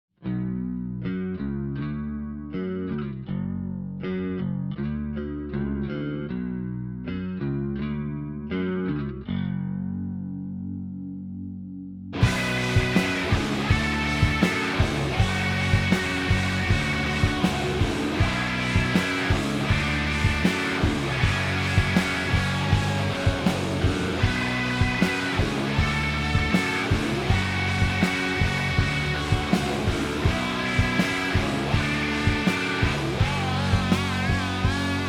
Instrumental Mix

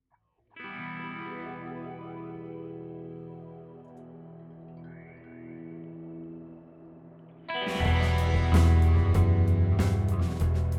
w Guitars

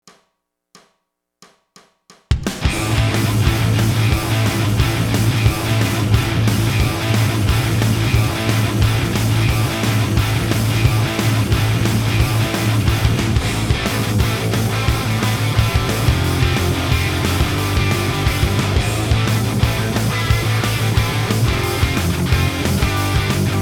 Am 178bpm

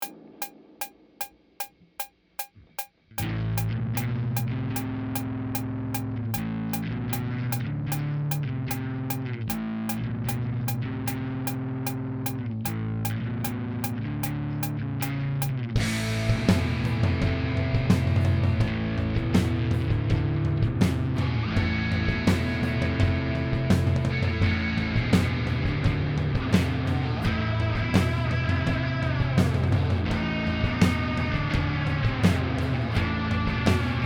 152 bpm; Pre Pro

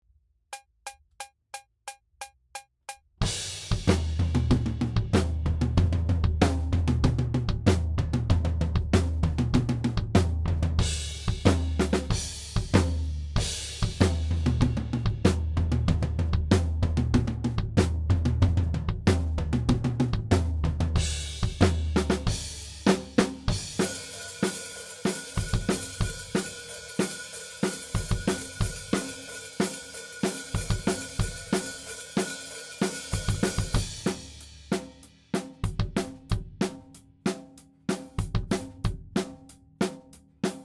Drums ONLY; Pre Pro

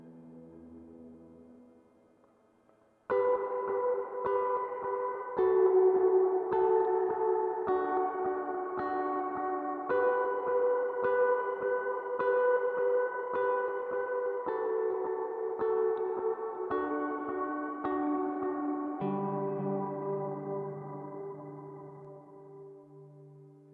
DI Line Guitar

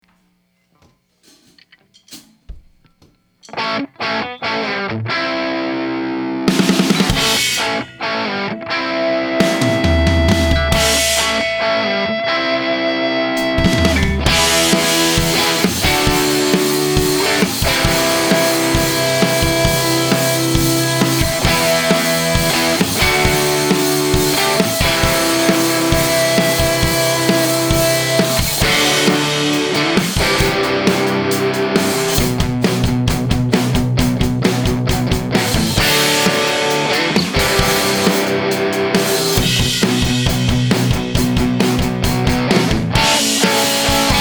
Drums Guitar

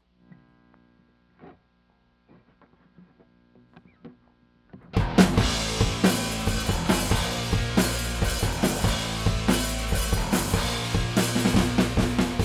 Drum Stems

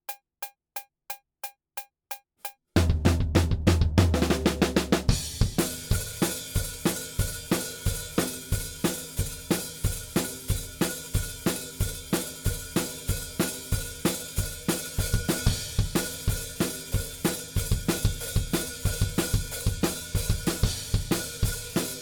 Drums ONLY; Pre Pro

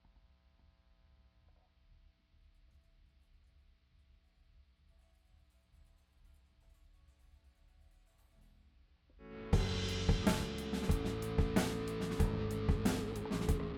Drum Stems

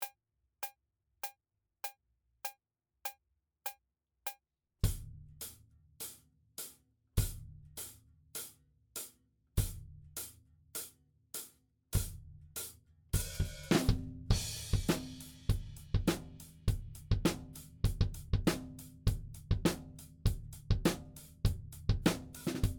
Drums ONLY; Pre Pro

